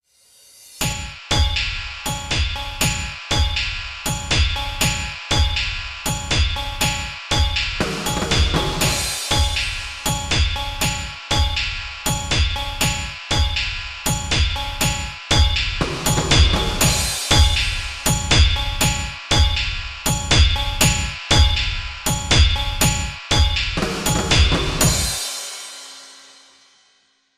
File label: industrial art